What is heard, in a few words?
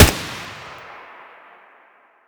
sig550 muzzle distant; weapons